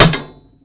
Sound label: shield hit metal metal; Mount&Blade Warband